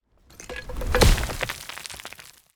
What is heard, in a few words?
npc stone golem big strike